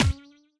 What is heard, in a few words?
stun; Epic Toon FX; MiniGame-PushPush